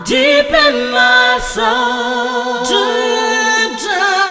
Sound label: Funky Vocals; USB Soundscan vol.18; AKAI